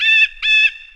gull; Mafie 1

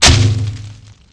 bush; Mafie 1

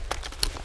pull; Mafie 1

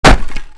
wood; Mafie 1

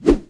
base bat; Mafie 1

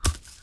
grass; Mafie 1

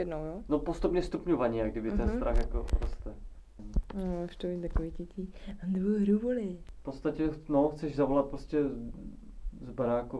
whimper; Mafie 1

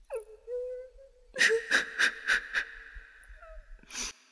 cry; Mafie 1

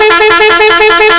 horn; Mafie 1

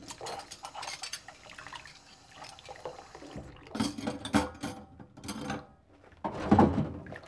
s wash; Mafie 1

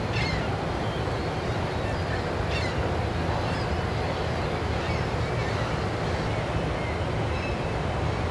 ambi; Mafie 1

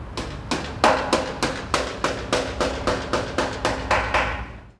build; Mafie 1

